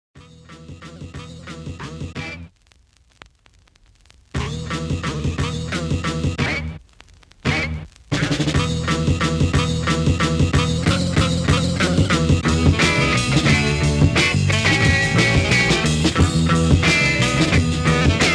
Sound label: lofi fun